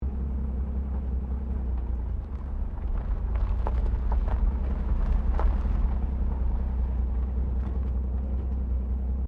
CAR HOLDEN FE INTERIOR TURN GRAVEL, Classic Australian cars; Holden FE 1957; Cars (Sound Effects:Vehicles)